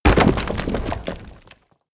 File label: break stone small